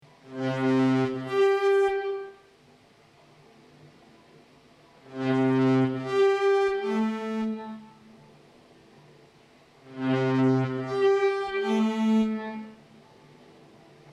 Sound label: Loop, Solo; national art center ottawa 13 august 1979